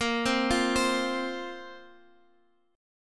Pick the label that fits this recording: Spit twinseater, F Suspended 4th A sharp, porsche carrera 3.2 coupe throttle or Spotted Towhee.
F Suspended 4th A sharp